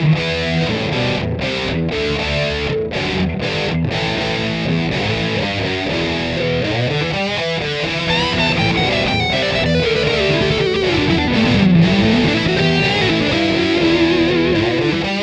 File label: Hagstrom Rock